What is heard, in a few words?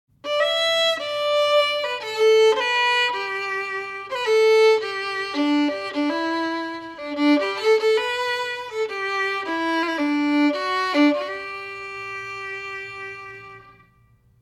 Waltz